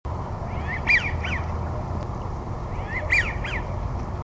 American Wigeon

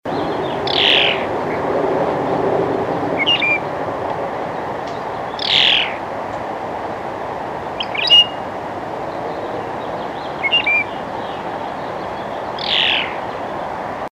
Phainopepla

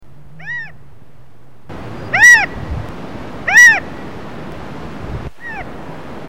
California Quail

